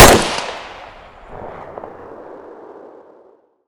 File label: rifle; SuperPower 2 Redux V7A; unit